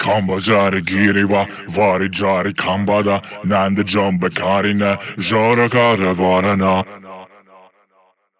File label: 138 Shamanic Voice (Wet), Psytrance